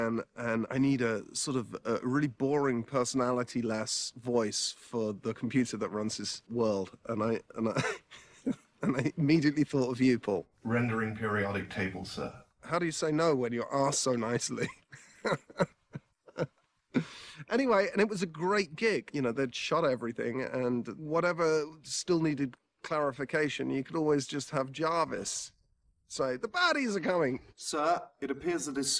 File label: vocals